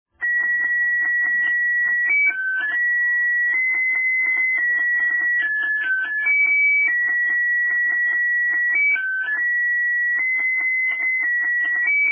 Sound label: Jingle Bells, 单音片系列铃儿响叮当音乐芯片; 音乐芯片AC150; SOP8贴片八脚硬封装; 单音铃儿响叮当